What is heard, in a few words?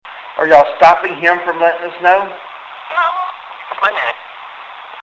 ghost box